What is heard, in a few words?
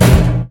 plats end, movers